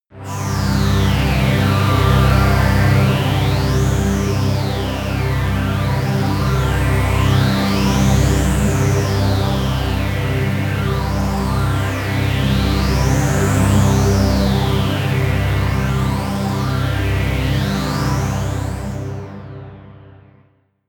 pad sweep robot; flaechen

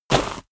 step walk gravel

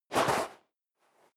step sneaker sand jump; schritte

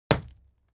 fight impact sweetener hard; krieg-waffen; nahkampf; soundpool

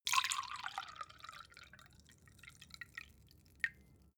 glass pour soft; soundpool

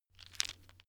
stone gravel clatter short; natur-tiere; steine

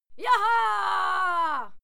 female cheer yaha; schreie; menschen

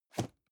cardboard box medium place slide soft; objekte

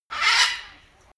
bird screecher; natur-tiere; voegel

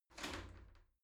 shower slide door slide short open

